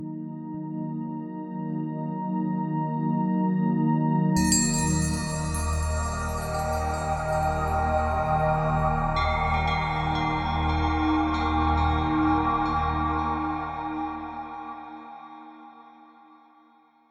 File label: Production Logo